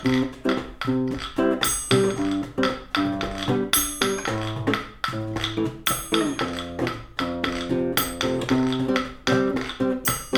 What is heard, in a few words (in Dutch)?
percussie; Zamina percussie; Zingen met Harmony